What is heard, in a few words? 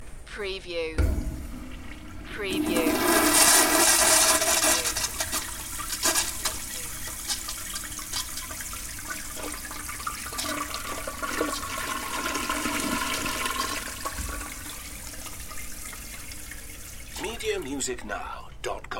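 Public Toilet Flush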